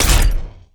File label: Sci misc Sniper Cannon